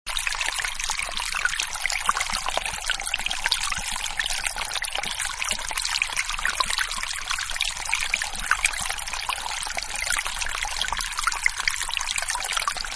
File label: Babbling Brook Very Close Up; Rivers (Sound Effects:Nature); Manhattan Production Music